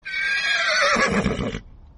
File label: unicorn sound; Arc of Templar